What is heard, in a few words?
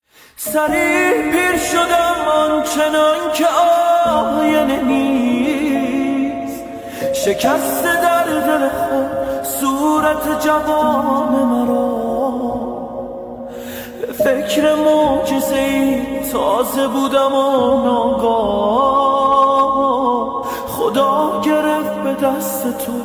Piano; ورژن پیانو